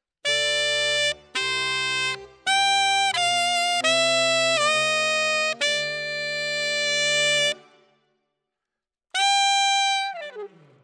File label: Alto, RNR for piano